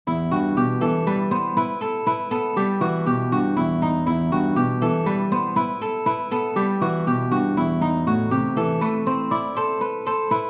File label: Gypsy Harp Complete Listen 120bpm